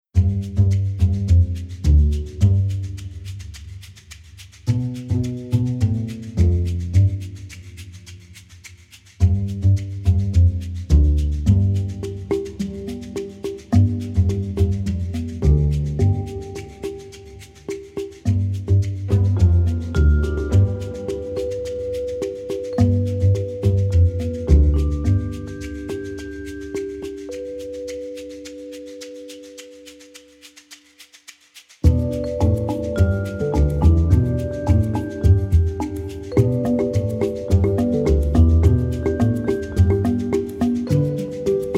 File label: Commercial groovy percussion mambo